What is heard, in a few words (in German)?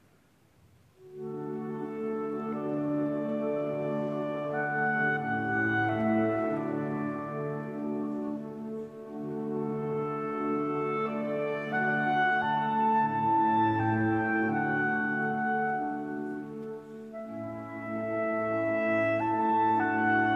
Klarinettenkonzert A Dur Adagio; KV 622